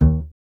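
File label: string contrabass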